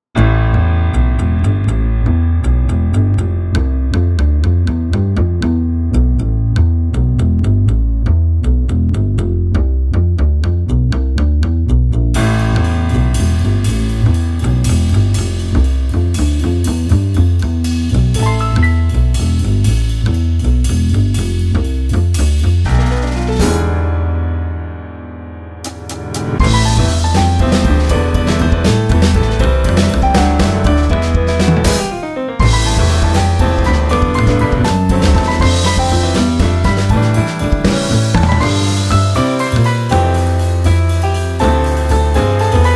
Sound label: bgm